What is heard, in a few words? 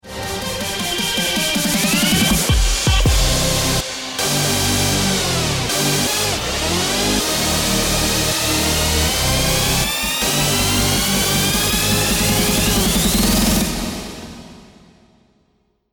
bomb ten sec count